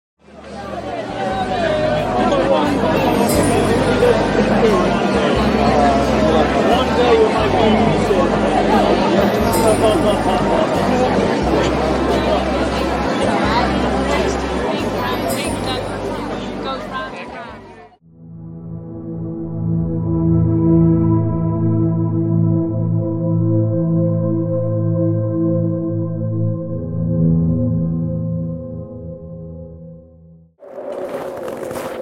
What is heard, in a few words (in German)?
Fantasiereise Geräusche